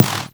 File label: Clap; Vengeance Samples